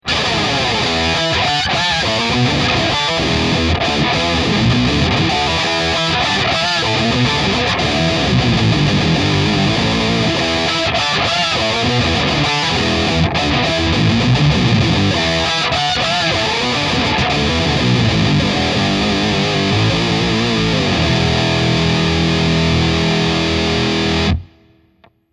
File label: OCT Harmony